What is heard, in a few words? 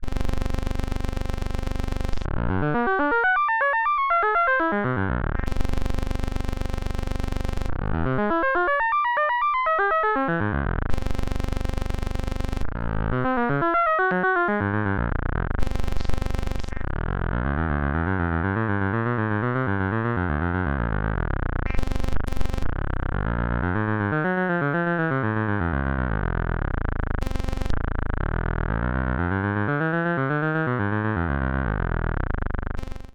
telephone game LFO als input feedback; Snazzy FX